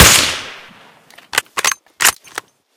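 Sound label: m98b shot silenced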